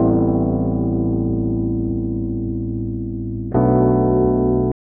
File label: E.PIANO, KEYS